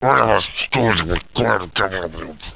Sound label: Troll Grumble; ShinyMetal